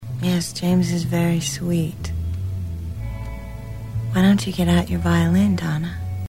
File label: violin